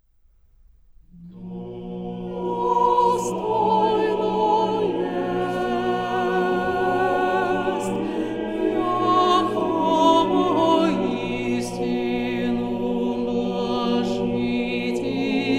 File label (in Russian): Хор Валаамского монастыря, соло